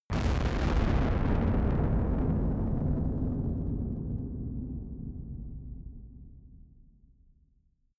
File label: distorted blast